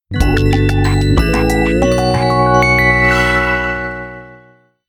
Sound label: Emerald Bell, notifications; Sony Xperia A SO 04E